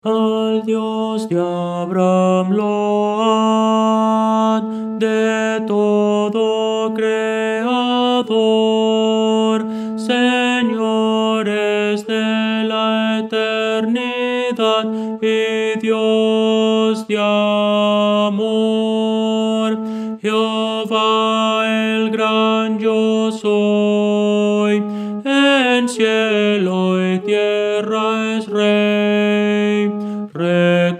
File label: tenor